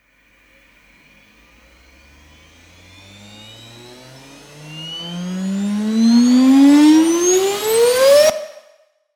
Vacuum Cleaner Rise, Rising vacuum cleaner power that ends abruptly.; Strange Tones; Sound Design